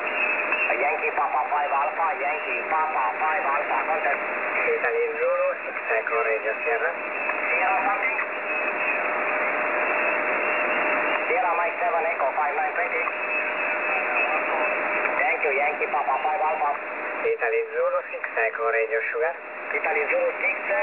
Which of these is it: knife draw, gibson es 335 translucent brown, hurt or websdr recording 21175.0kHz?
websdr recording 21175.0kHz